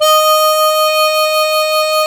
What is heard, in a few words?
HARMONICA